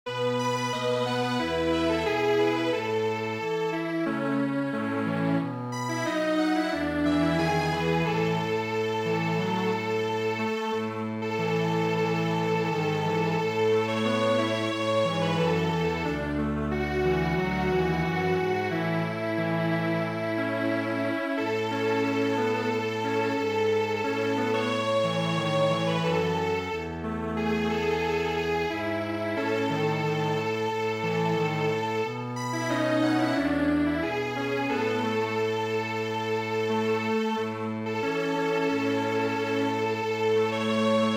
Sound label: Strings Quartet, traditional